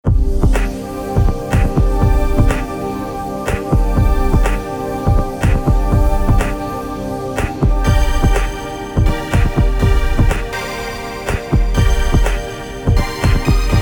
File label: synth; synth invasion for padshop